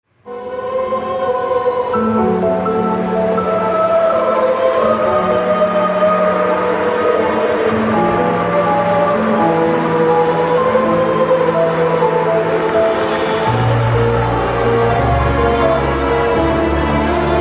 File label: Music; Drustvar; WoW Battle for Azeroth; BlizzCon 2017